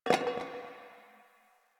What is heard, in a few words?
Garbage Metal Can; Warframe; Ambience; Nineteen Ninety Nine; DE